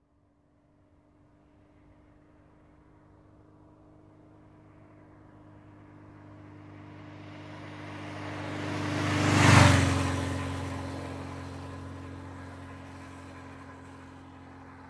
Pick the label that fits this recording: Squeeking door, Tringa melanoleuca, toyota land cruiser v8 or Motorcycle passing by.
Motorcycle passing by